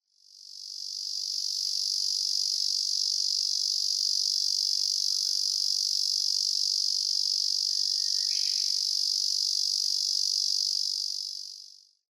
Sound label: Tibicen japonicus; エゾゼミ; セミ科; 日光市稲荷川中流 alt=730m; Rec.: MARANTZ PMD670; Mic.: audio-technica AT825; 他の自然音： ウグイス・ハシブトガラス